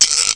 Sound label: Snd Cheval; CD Action